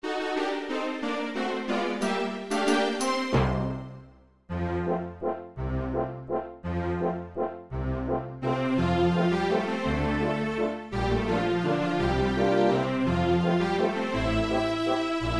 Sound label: Waltz